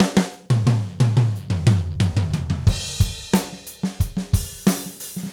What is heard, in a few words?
Gretsch Groove 90, 1967 Gretsch Kit, 90 BPM, Drums